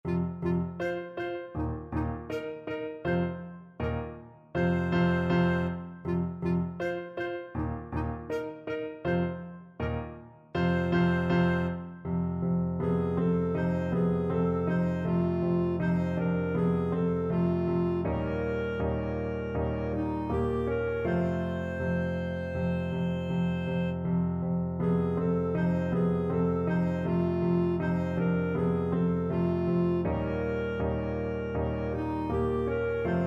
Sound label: thumping blues, clarinet